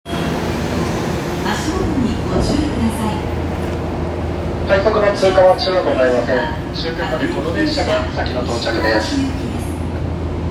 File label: train sounds; 東西線東陽町東